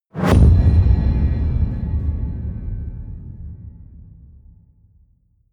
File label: promo pad; whooshes rises; hits impacts